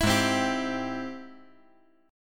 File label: Ab Augmented Major 7th Ab 5G,4C,5E,5A; strummed; 9,8,8,10; JGuitar